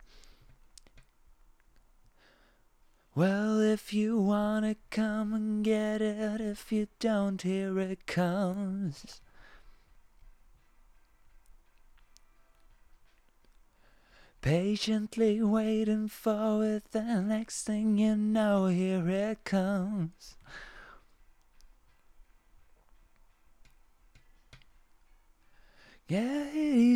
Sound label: vox; logic